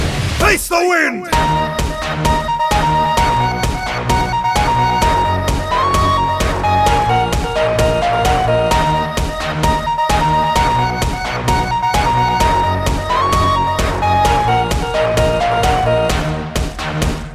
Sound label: Epic Flute; Yasuo